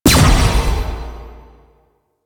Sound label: Player Weapon Projectile Parry; Warframe; Duviri; Duviri Dax; Weapons